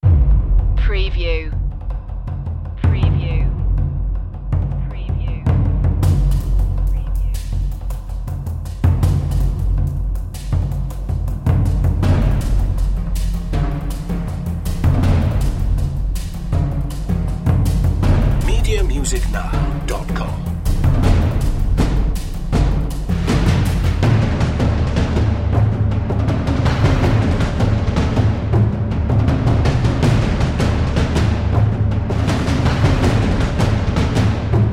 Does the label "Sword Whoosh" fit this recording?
No